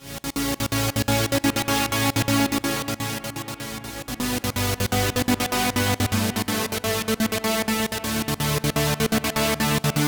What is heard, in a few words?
gated strings 125bpm; lolicore